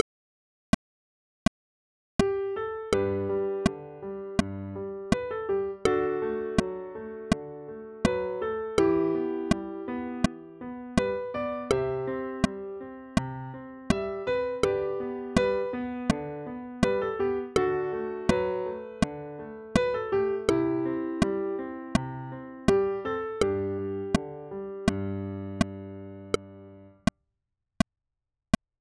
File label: country road G